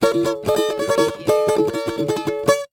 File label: Cartoon Guitar Strum Strings